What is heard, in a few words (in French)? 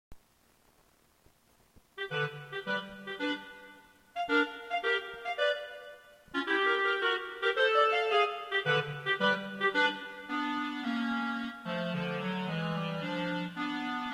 clarinette